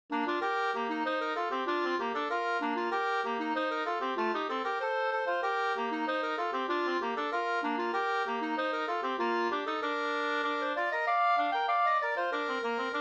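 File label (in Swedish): anglas 2 stämmor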